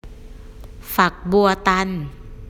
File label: shower trouble